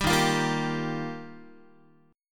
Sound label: D sharp Major Add 9th D sharp Guitar, chord; D#add9 strummed; 10,11,8,8,11,x; 4A,4E,5A,5D,5G#,6C#; JGuitar